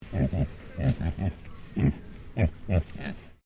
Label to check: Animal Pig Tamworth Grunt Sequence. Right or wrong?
Right